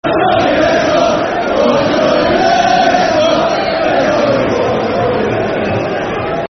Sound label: Football Chants (Soccer Songs); Jolean Lescott; Everton Efc